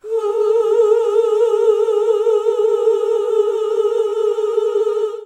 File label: OOH A3, GOSPEL; Best Service; Hallelujah; GOSPEL 10